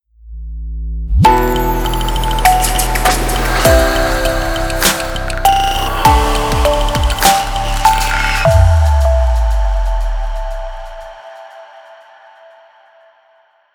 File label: chill logo reveal; audioblocks